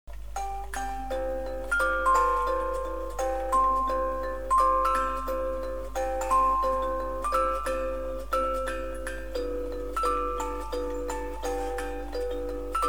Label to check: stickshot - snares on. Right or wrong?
Wrong